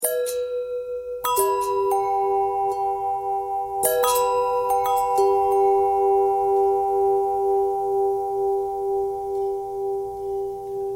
long bell long bell thread simple, bells

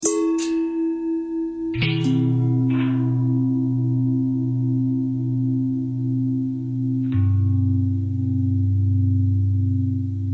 long bell long bell thread pentatonic, bells

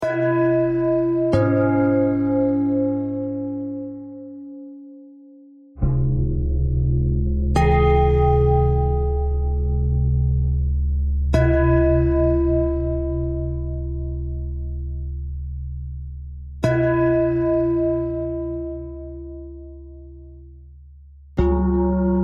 bell thread pentatonic

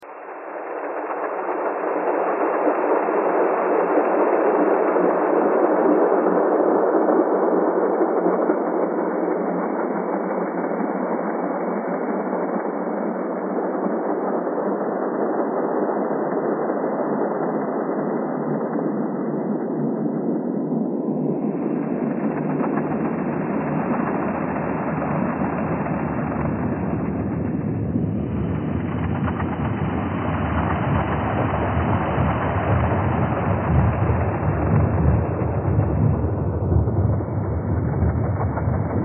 thread pentatonic

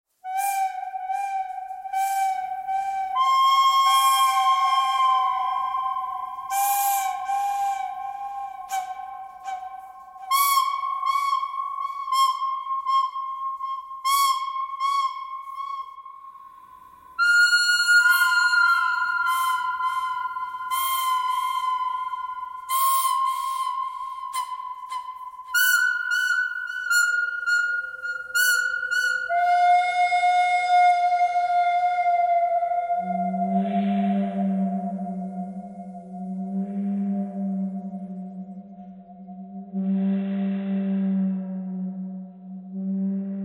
bird cry bird canyon harmonic

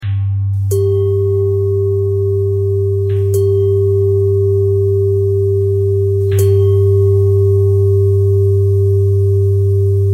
bell thread pentatonic

